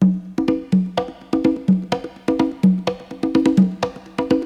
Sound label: BONGO LOOP; Crypton - DrumBase5000